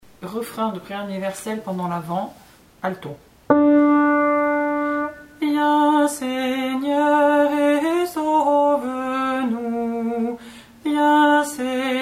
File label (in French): Refrain ALTO; de PU AVENT Viens Seigneur et sauve nous Bach